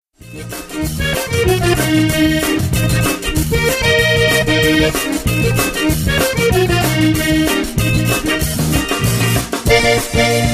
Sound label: hot steppin zydeco